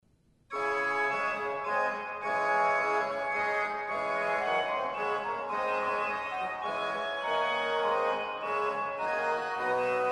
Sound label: galliarde